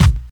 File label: rich highs hip hop steel kick drum sample a sharp key; 1990s